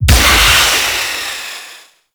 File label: Health Leech Activate; Warframe; Eximus